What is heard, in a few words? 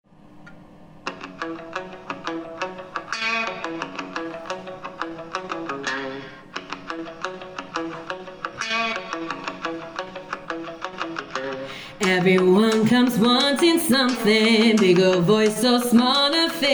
Vocal; Caterpillar; VOCAL GUIDE